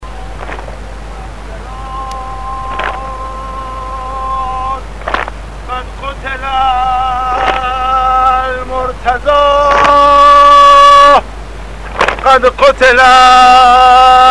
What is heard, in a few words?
moazen azan